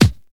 silky kickdrum g key, Royality free kick drum single shot tuned to the G note. Loudest frequency: 961Hz